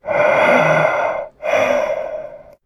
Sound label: shape breath; tf; slender